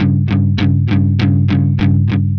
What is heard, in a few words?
Electric Guitar, Loops; Guitars